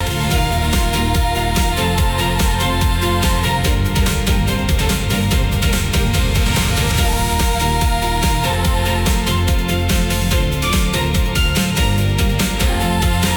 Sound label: loop